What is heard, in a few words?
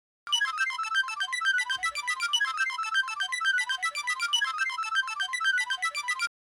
telephone new cell